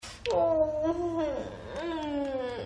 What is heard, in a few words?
suck; Low Blow